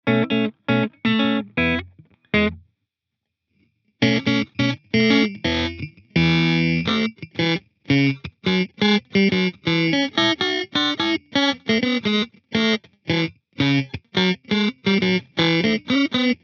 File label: Lofi Digital; echosystem